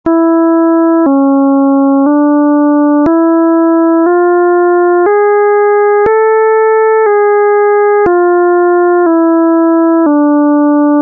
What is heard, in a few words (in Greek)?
Χρωματική Κλίμακα του Νεανές, Νη = 220Hz